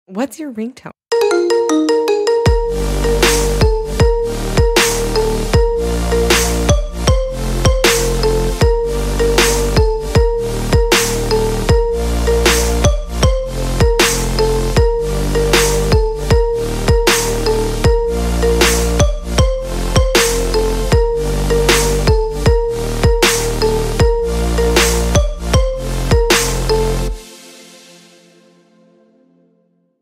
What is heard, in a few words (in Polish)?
iPhone 13, dzwonki; telefon komórkowy; iOS; Androida